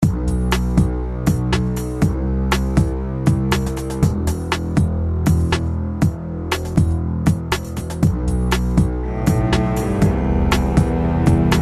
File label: Keyboard